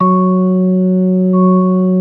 B3 F#4; Best Service; Hyper Dance

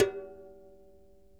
Berimbau, World Percussion; Sony CS

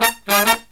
Killer Brass Riffs, 108 soul

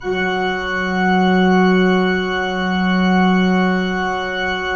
PIPE, 3DOrgans

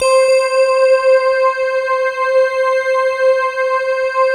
bell choir, slow bell choir; Optical Media International - Sonic Images Library

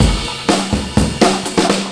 DRM 124, Breakbeat; Best Service ProSamples vol.24; AKAI

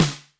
HR16 SD; Crypton - DrumBase5000

